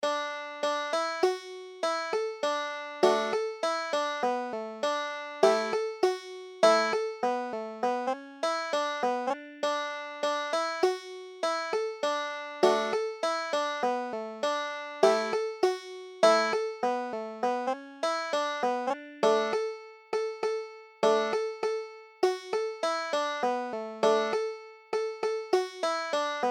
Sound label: ducks on the millpond, banjo